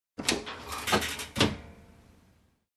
Closing Dish Washer

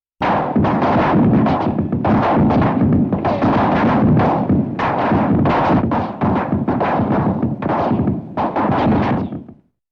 Gunfire exchange and Bullets Fly by

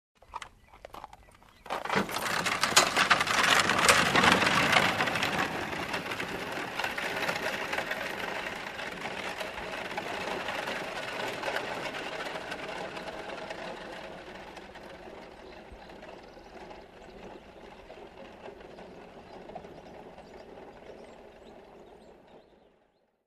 Horse Caravan

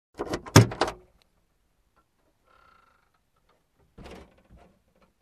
Opening Pickup trunk

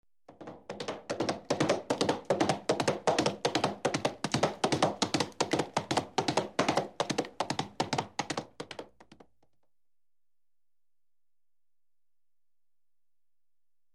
Horse galloping at full speed

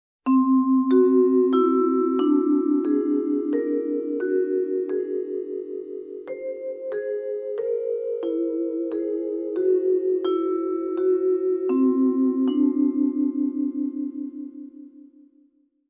Mysterious and dark mallet melody

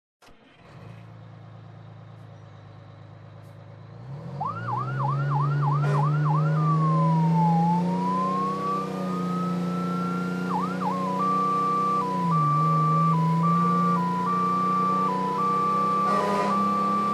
Firetruck driving from Cockpit

